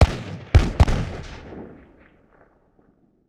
Musket Volley Distant, Period Combat; Sony Pictures Sound Effects Series

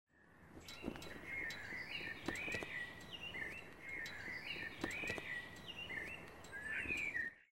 Small Camp; Age of Empires III; cinematics

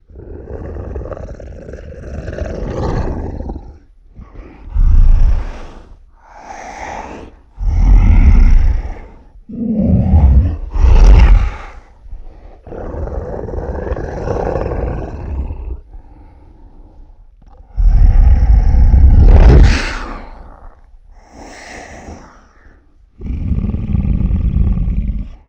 Snarling & Breathing, Vocals - Monster; Sony Pictures Sound Effects Series

